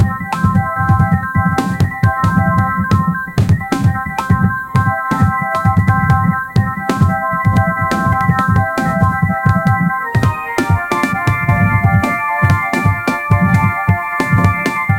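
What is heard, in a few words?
8 bit casino music background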